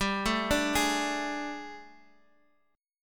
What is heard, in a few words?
G Suspended 2nd Add Flat 9th G, chord; Gsus2addb9; sus2addb9:1,2,(5),b9; x,x,5,2,3,4